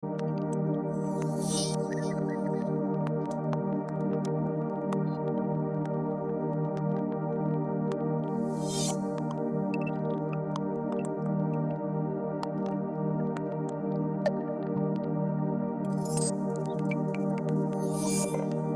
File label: bgm